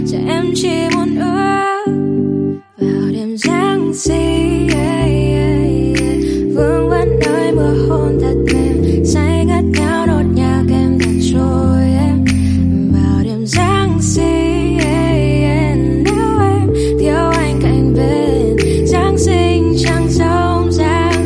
Nhac Chuong Chang Giong Giang Sinh

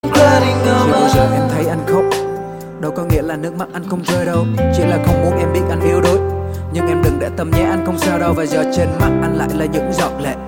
Nhac Chuong Rap

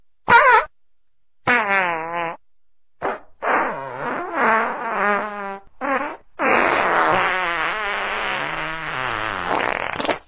Tieng danh ram; nhac chuong

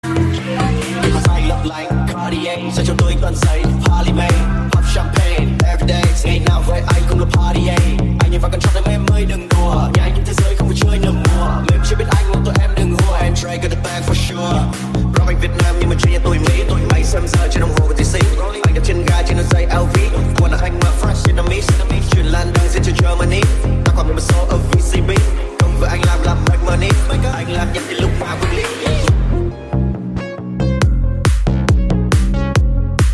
nhac chuong remix

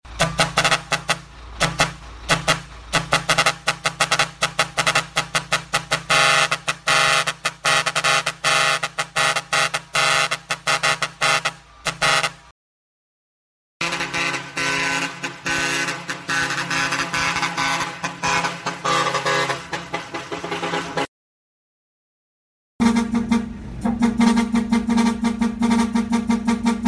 Tieng coi ong vang

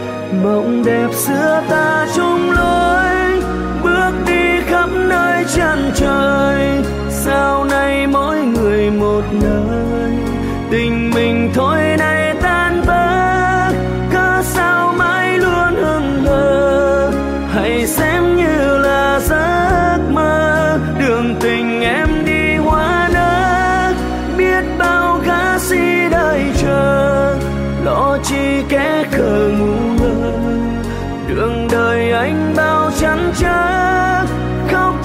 Nhac Chuong

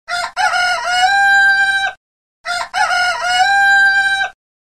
tiếng gà gáy nhạc chuông; nhac dong vat

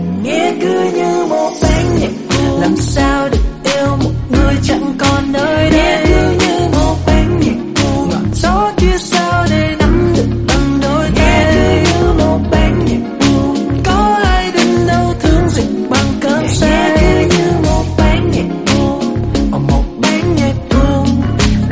Nhac Chuong Ban Nhac Buon

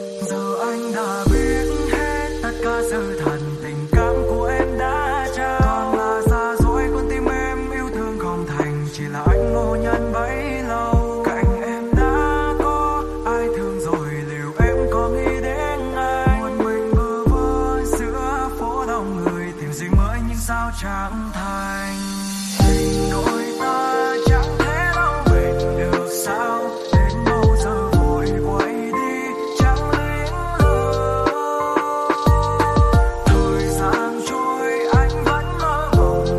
Nhac Chuong Lofi Ver

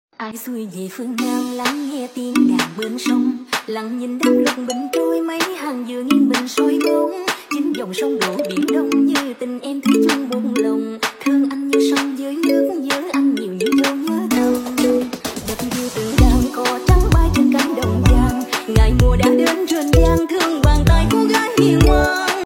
tiktok; nhac chuong

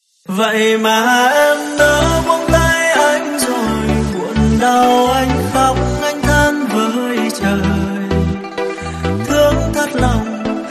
Nhac Chuong Remix

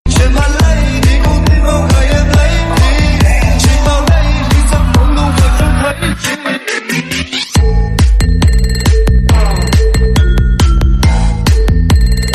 Nhac Chuong Thu Thach Bat Beat Tiktok

